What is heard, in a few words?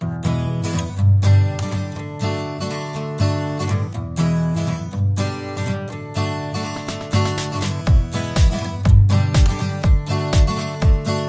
Strummin Along